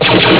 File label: phaser